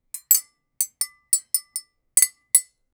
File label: tap two metal objects tog; clinking